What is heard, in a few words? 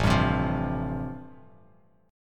A-Minor 7th Sharp 5th, Bass Standard, chord; Am7#5 strummed; 5,10,10,10; JGuitar